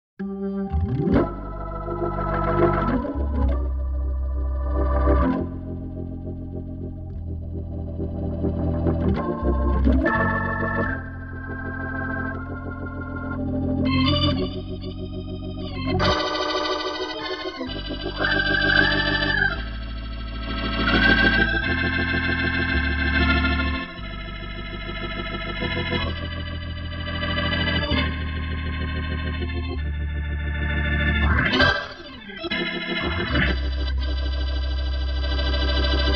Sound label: hammond organ